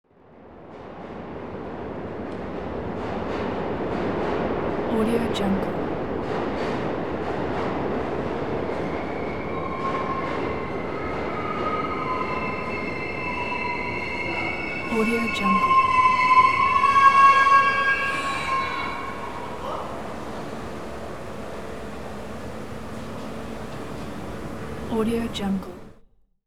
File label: high pitched subway brake squeal; hollywoodedge